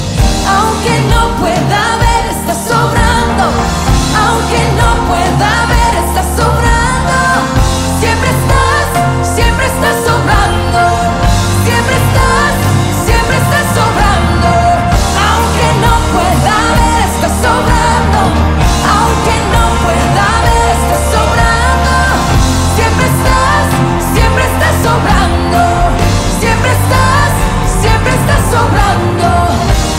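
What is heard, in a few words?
Spanish Ringtone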